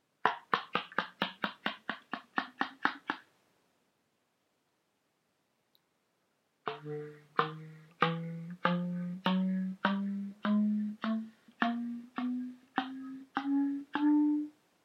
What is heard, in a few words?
slap with and without pitch